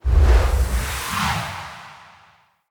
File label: Decoy Cast; Fey; Saboteur